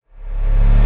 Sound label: Reverse Tension C#; trap; FX; Cymatics; Trap Starter Pack